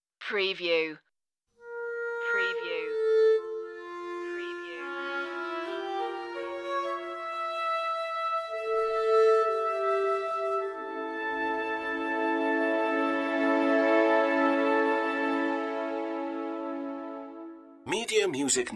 winds horns only phrase

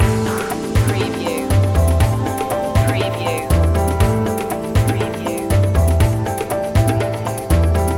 eastern vibes loop

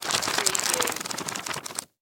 Book Paperback Page; sound-effects-bible

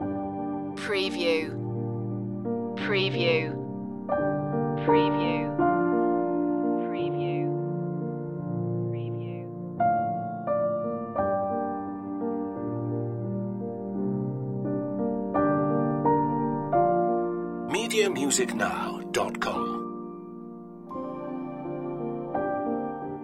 ambient singularity loop

